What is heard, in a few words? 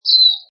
Peep, Single, cute, small bird tweet, chirp.; Birds; Animals